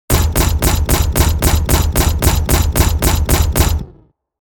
Sci fi weapon machine gun slow firing

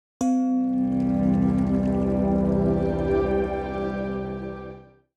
Meditation yoga logo intro

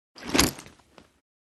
Military equipment movement; military equipment sound effects

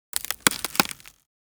Ice crunch and crack

